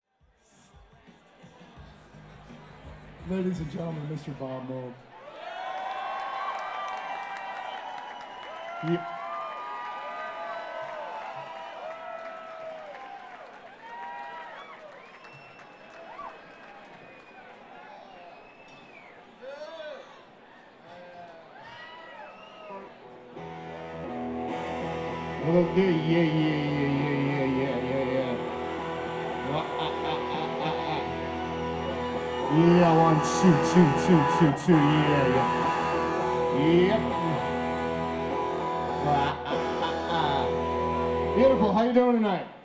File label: Intro Tuning Hi